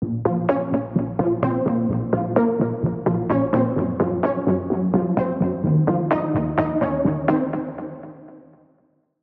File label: Key, Vital; Propulsion; Routed Sound; Vital Expansion - Star Scavenger Vol.1